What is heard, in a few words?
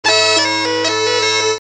Duda